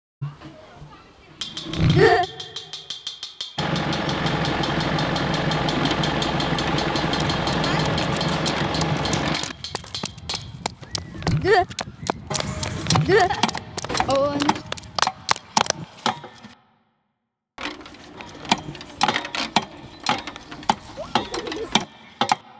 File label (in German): Space Sound